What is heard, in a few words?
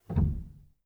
rel, Grand Piano; bitKlavier Grand; Piano Bar